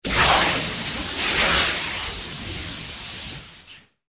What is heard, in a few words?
Sound design element energy bolts electricity electrical beam; BLASTWAVEFX; 能量音效